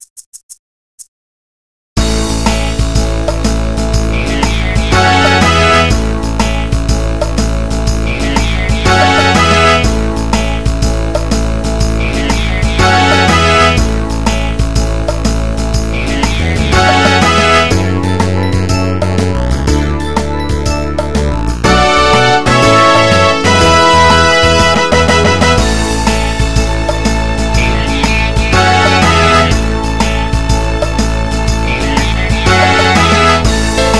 motown song

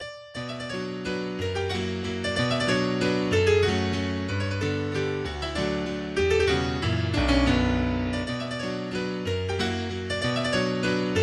Mazurka in B Minor Allegretto

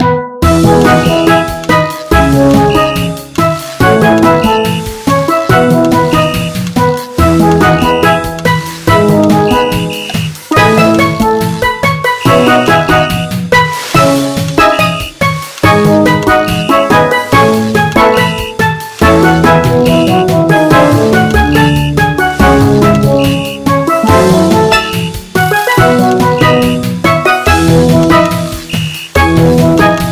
Piano Solo

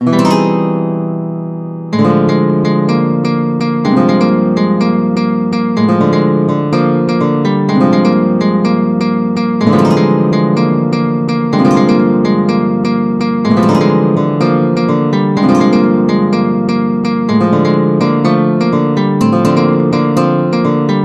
test guitars

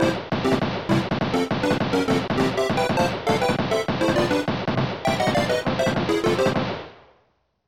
Music; SubSpace

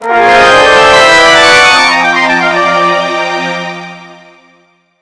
Power Off

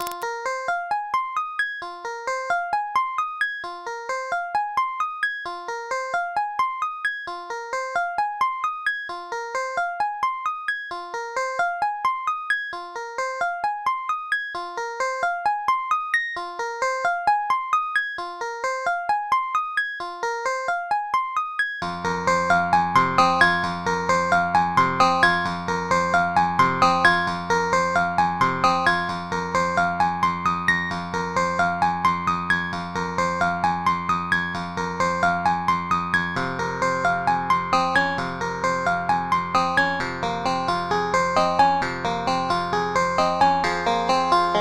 clavinet; MIDI; Minecraft

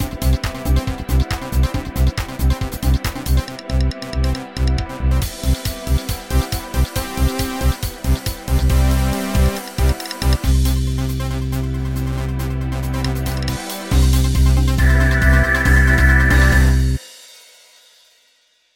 EuroTrance

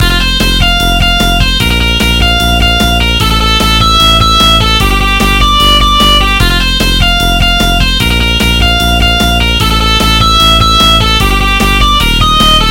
Rock Solo

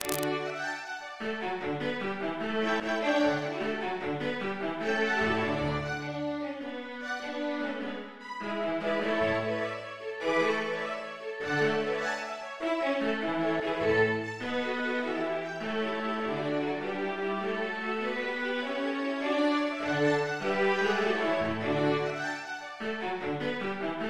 Haydn String Quartet in D major

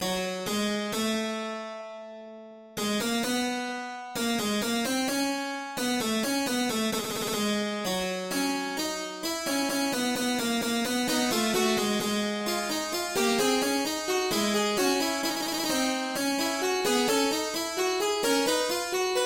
in F Sharp Minor; BWV 859

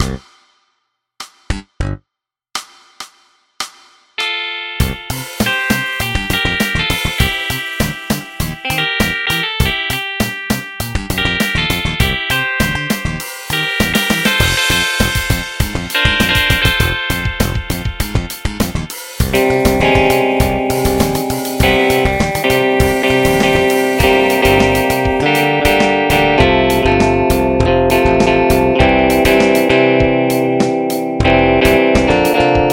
the 70s groove

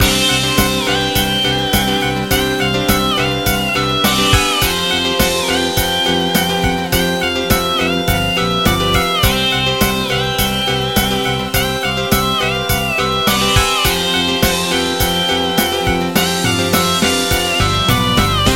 Hard Rock; PSR-3000

